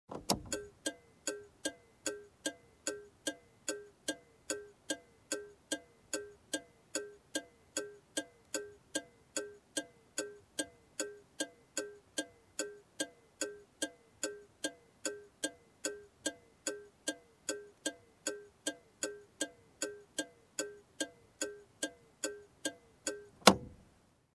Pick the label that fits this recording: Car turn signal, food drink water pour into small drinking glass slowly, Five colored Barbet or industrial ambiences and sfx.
Car turn signal